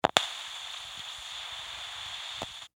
Technology Cb Radio Turn On Static Turn Off; Sound Design

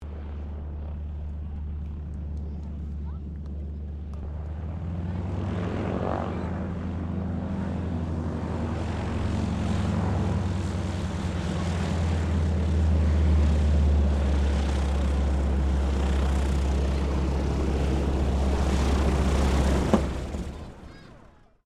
AIRCRAFT AT 6D HARVARD MK III TAXI SHUTDOWN; Harvard Training Aricraft; Airplanes; Vehicles

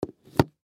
Gift Box Full Down, Gift Box Full Set Down Cement; Set Downs; Foley; Blastwave

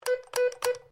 MORSE CODE S; Morse code aplphabete; Morse Code (Sound Effects:Electronics)

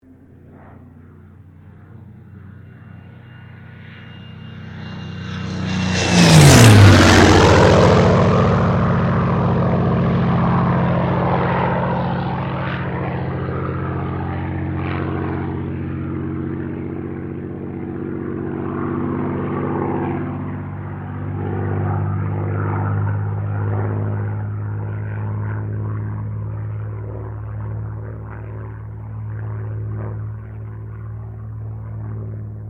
AIRCRAFT SUPERMARINE SPITFIRE MK-XVI PASS; Airplane Sound Effects; Vehicles

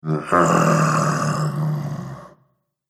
Zombie Grumpy, Zomby Sound Effects; horror

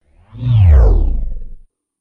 Exhausted Laser Sound Power Down Robot

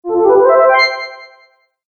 Fantasy Magic Accent; Magic Sound Effects

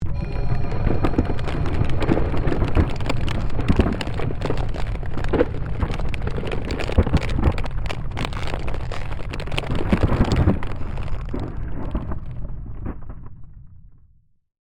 Created Magic Spell Glacier Quake; Series of created spell sounds

